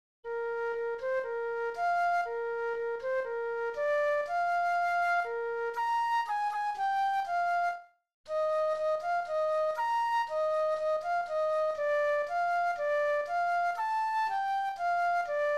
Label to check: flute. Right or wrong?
Right